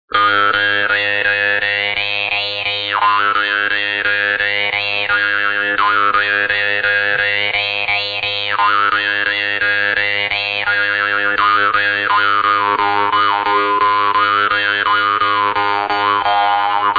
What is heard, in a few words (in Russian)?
Muzyka mira Bashkiria Kubyz Vargan